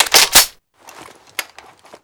reload chamber, foley; mg4

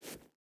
footstep barefoot sand jump start; Rust

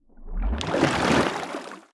submarines engines; World Of Warships

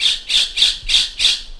birds karelia; World of Tanks Blitz

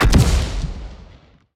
impacts; World of Tanks

